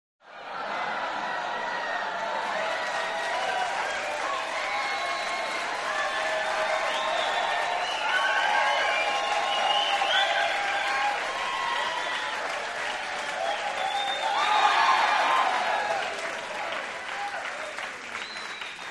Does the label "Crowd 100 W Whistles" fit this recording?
Yes